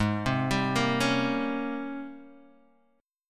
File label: G sharp Major 11th G sharp, down, Guitar, Open D